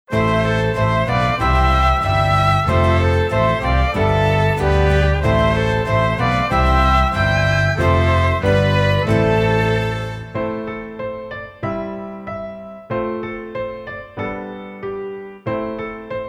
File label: Orchestrina; LOrchestrina Vers.2